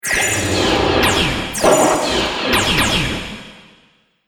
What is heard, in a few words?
Starship Ion Cannons; Spacecraft Sound Effects